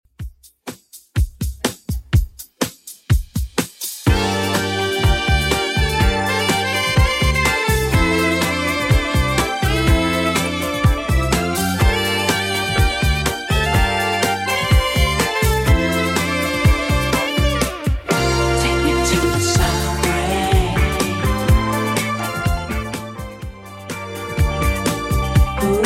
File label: 80s Redrum 124bpm